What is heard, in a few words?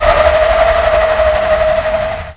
SPIN CEM; Millennium Gold 2000; Monster